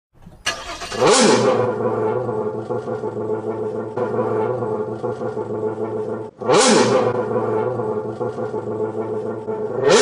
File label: lamborghini; lambooo